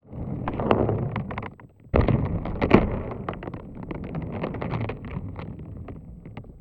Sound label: Plastic Tub, contact mic, Marbles; musicradar; contact mic madness; Raw